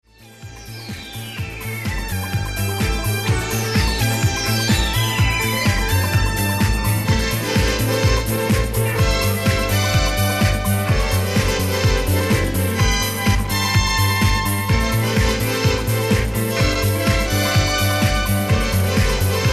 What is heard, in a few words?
robot is systematic extended